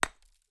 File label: sling stone, attack impact